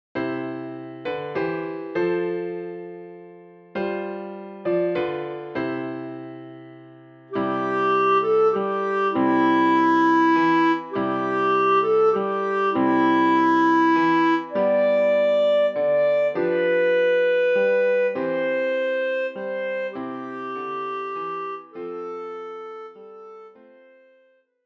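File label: Silent Night, Bass Clarinet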